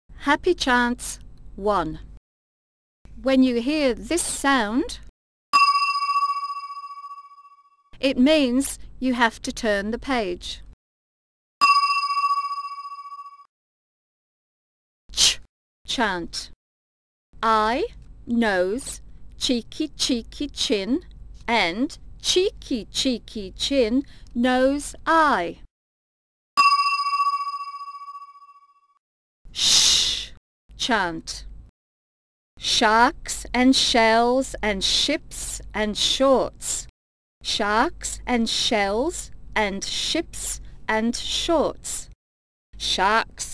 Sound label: Happy Chants